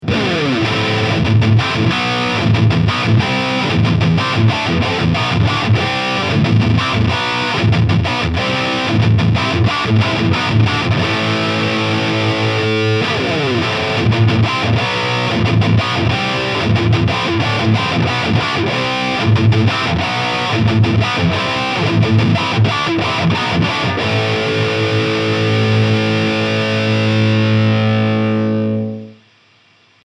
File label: Solar Guitars E1.6 High Gain; Vinter Perl White Matte